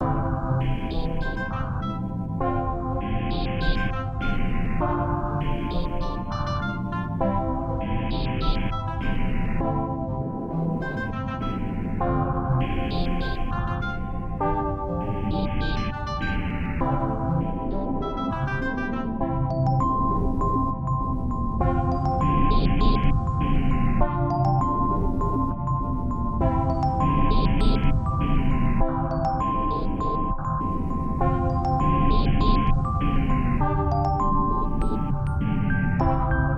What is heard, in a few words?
wind